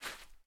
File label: Step Sand; miside demo